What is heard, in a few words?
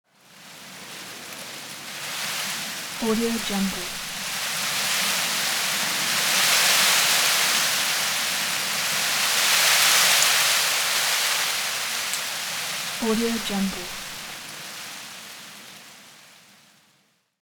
vegetation tree leaves rustle medium; foley; smartsoundfx